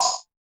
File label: Drums; Distored Reality